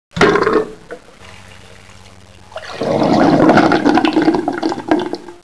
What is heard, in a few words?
gurgle